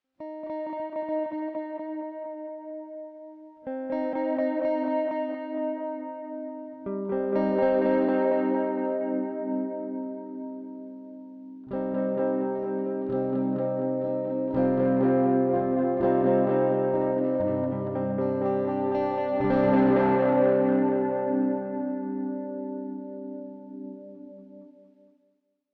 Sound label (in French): guitare; pulsar echorec